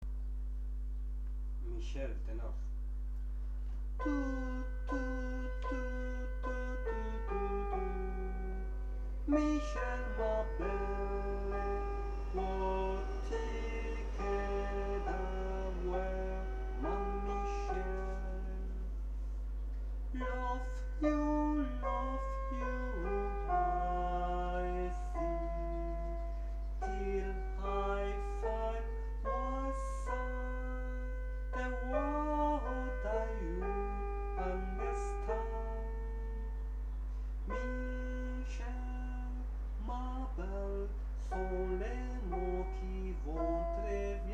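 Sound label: tenor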